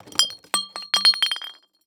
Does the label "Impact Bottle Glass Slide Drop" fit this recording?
Yes